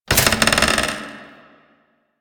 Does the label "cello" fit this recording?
No